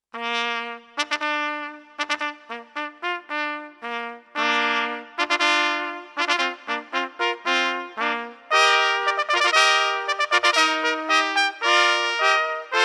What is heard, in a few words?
fanfare